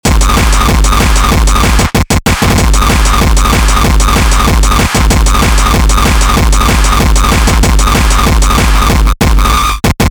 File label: New HardCore; drum; beat; Drum Blaster: The Ultimate Beat Generator, Sampler Plugin for Modern Producers